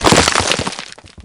rock crumble

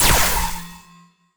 light bolt

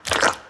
jump; gish

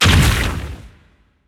beast lava ball splash

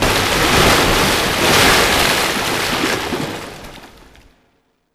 water thrashing reverb; boss2

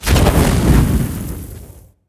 flame whoosh; war

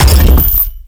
ball and chain hit

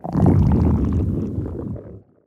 growl large; fistula

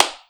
clap

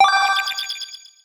pickup lucky

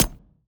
boomerang hit

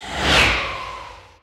beast ghost dash

